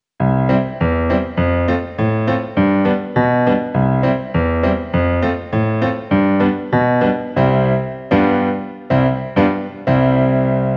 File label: Piano Bass Chords